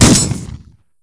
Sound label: fire; sshotgun